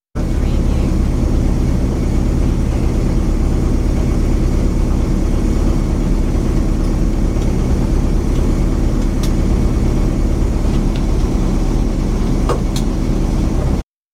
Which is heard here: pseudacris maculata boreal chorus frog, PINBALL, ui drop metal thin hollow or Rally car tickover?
Rally car tickover